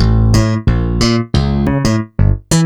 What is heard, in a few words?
bass (snapper); Sonic Foundry (Sony Creative Software); Ugly Remnants VOL-2